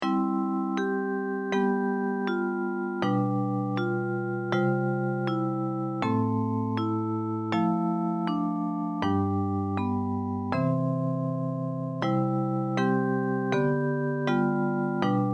short melodies classical melody

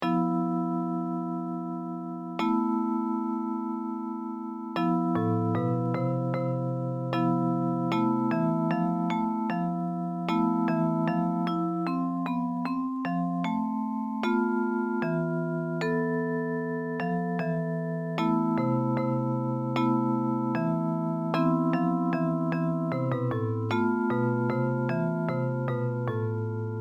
japanese folk song; osaka no komoriuta

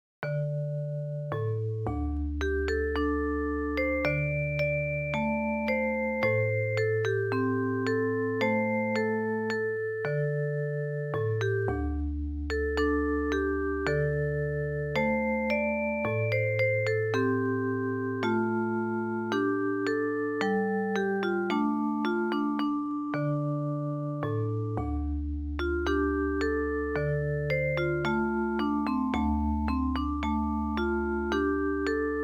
japanese folk song; sekino gohonmatsu

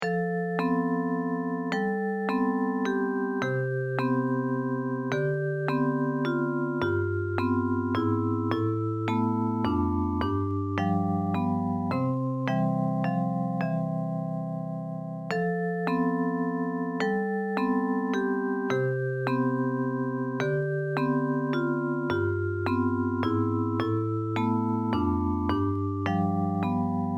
simple waltz

